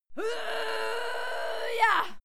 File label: female fight scream yah struggle